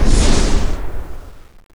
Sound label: ice